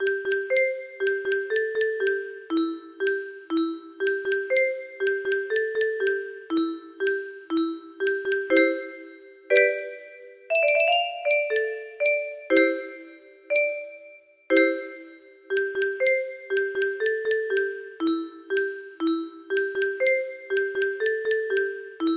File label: Music box, music box melody